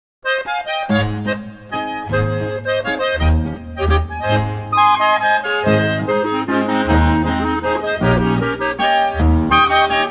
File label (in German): Volksmusik